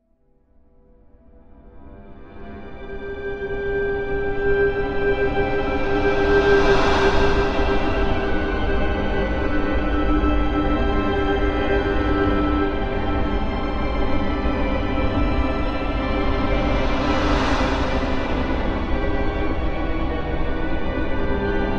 Music Eerie Dramati, orchestral

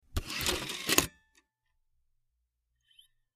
Cd Rom Door Open Tray, computers

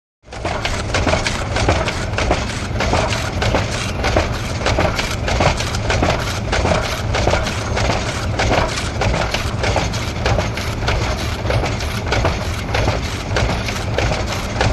Ovaltine Machine Rhy, factory

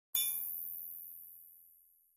Spring Thin Sci Fi, buttons & beeps

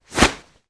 Nylon Swishes Quick, whooshes & swishes

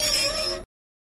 Car Brakes Low Squeak

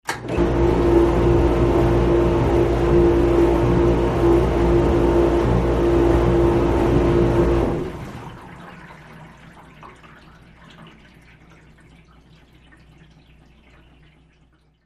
Dishwasher Running

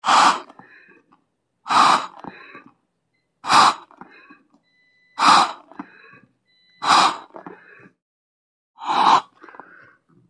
Respirator Pumps Oxy

